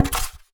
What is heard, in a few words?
Polystyrene Rattle